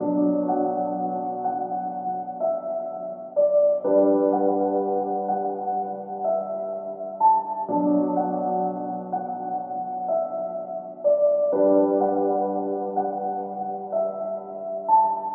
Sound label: Nostalgic Melody Loop - 125 BPM B Min Piano; Melodies; Loop Stems; Cymatics; Oracle Sample Pack